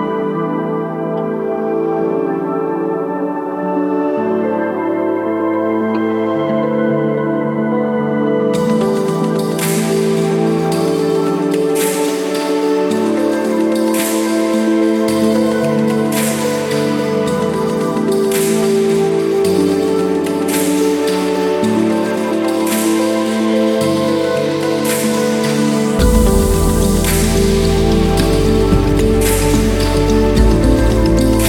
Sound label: Bridge BGM; Neoverse